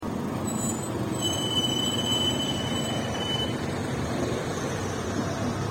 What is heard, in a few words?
bus arrive